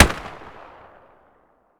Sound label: muzzle far, weapons; vpo101